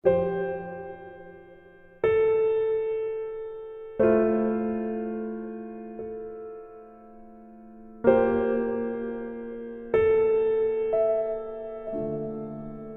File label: Music BGM Ending